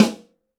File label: Snare, Roland TD-20